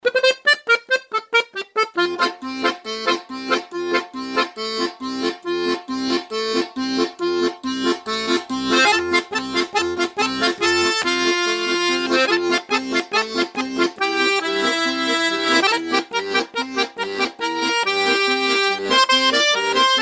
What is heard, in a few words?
accordion